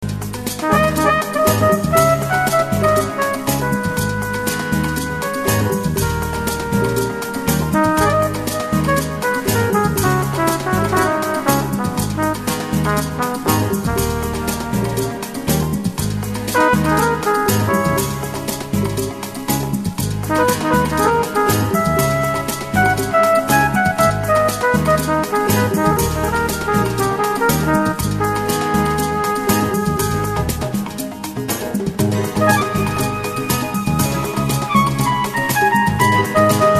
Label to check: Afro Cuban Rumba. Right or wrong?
Right